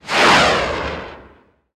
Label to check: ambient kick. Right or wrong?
Wrong